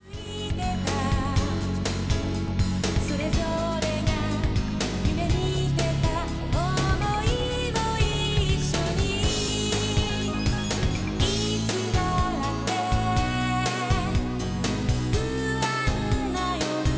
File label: music